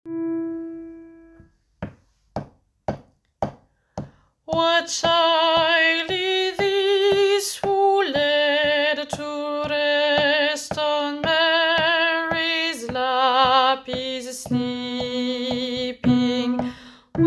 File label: What child is this bass